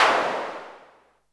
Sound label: clap, Novation Bass Station 2